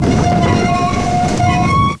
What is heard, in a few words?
Metal Door Open; Playable Tutorial Level; TR1